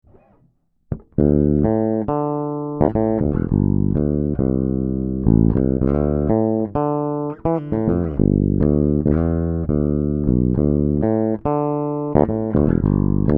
Harley Benton BZ 5000 Bridge PU passive Fingerstyle